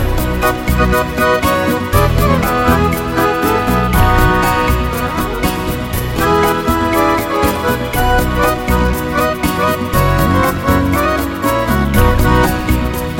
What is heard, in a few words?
Wonderful and Beautiful